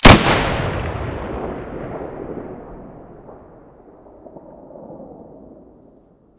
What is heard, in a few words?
muzzle sv98 stereo